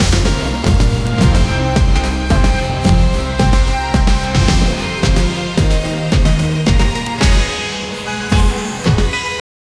shocking music

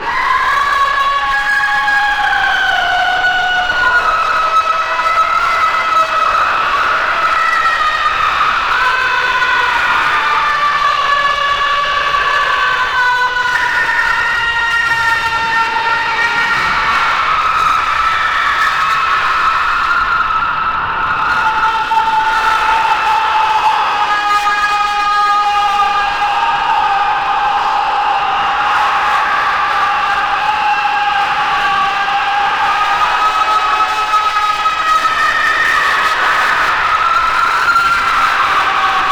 goblin battlefield scream

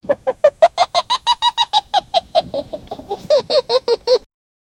monkey laugh

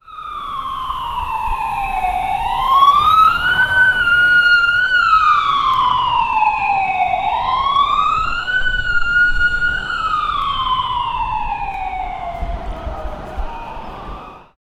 a person smoking a cigare

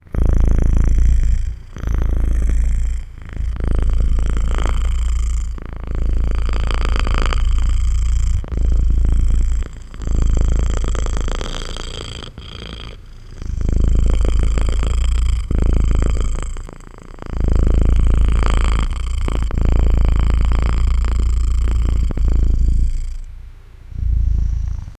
a large sabertooth anthropomorphic; SFX Engine

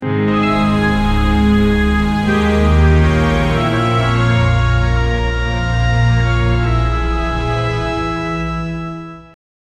brief hopeful melody, pirate

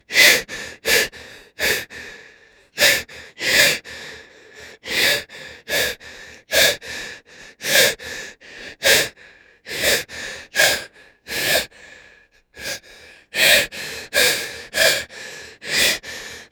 young man having difficul

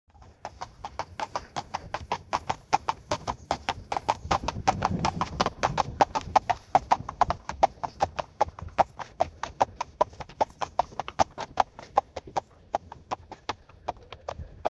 the sound of a horses, rural

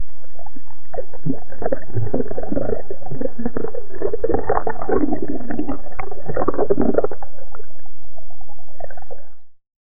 a single deep realistic, underwater

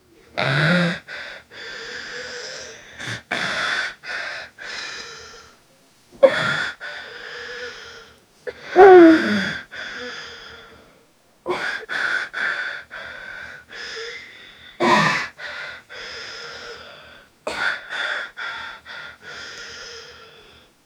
18 years old man holding, mouth closed

